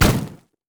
turret explosive; LoL; heimerdinger

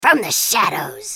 attack; Kennen; Character Voices - English

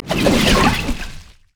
sonic wave; LoL; leesin skin05

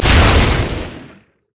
explode; LoL; velkoz skin01

